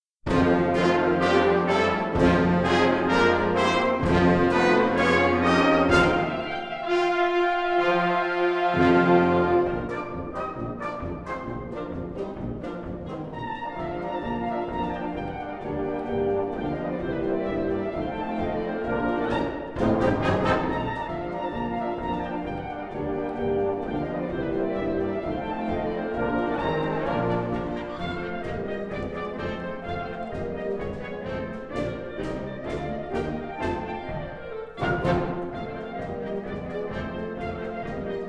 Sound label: Clarinet Escapade